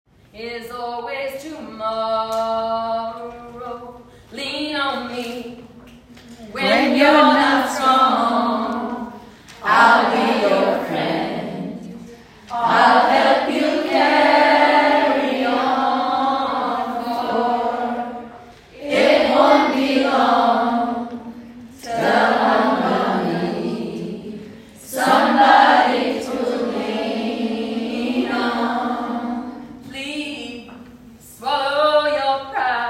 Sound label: Singing